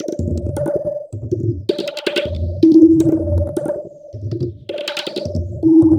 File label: TABLAS; Zero-G; Chemical Beats